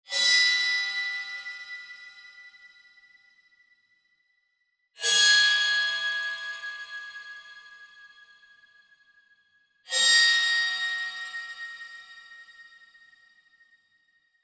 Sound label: Stings Elements Gong Bow; Natural Forces